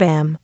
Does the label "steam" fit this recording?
No